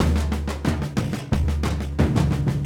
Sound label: Surdo; Ambient Grooves; World Groove